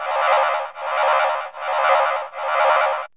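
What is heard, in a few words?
METAL DRONE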